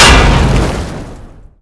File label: tank shell metal; wac